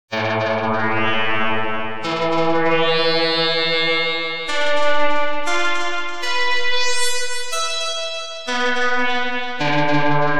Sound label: midi synth